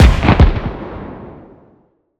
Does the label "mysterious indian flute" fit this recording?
No